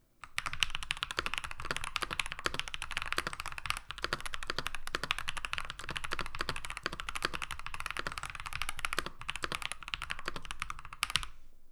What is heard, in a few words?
keychron k10 qmk sound; Keychron K10 QMK Version 2